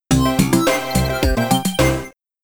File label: Snowy Peaks Jingle; Whizz; Amiga